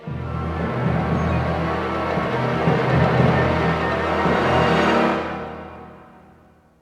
Stinger; Rin Orchestrator of Doom

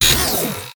Boom Bot Death

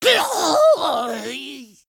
Death

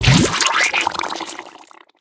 Toxic Sewer Ooze Death

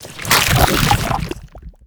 Dragon Egg Death

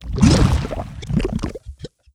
Acidic Swamp Ooze Death

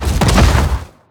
Dragon Wing Flap Underlay Death

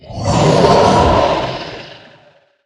Mana Giant Attack

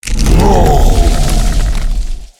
Death Blossom Whomper Attack

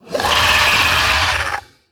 Wasteland Scorpid, Play

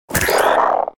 Frog Mount Death

